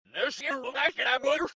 chromatic

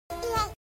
yay

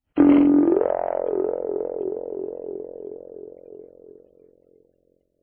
spring

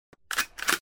reload

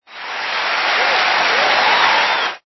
crowd applause, reverse

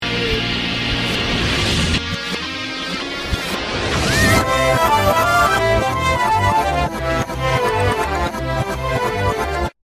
stage clear

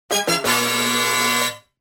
shock

